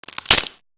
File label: crackle